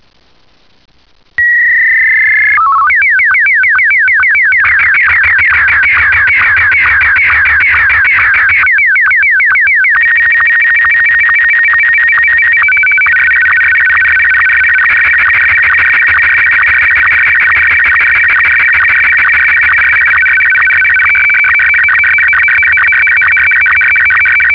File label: sstv; Bildübertragung, Karten, etc.; Mode: Martin 1; FAX-Klangbeispiel; Typ: HF-FAX